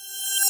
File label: On Hover; VR Development with Unity and Meta Quest 2; interaction advanced